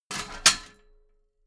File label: metal ladder small handle unfold heavy